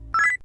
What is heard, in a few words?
confirm; smt